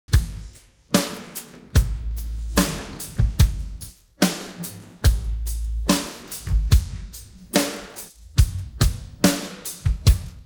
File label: pattern, drums